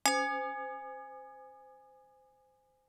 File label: glass bell